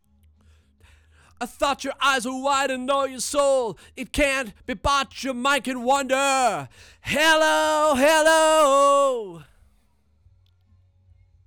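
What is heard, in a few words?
vocal; Pro Tools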